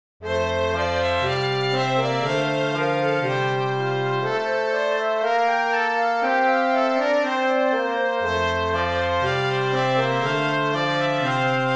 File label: Quartet Change Prog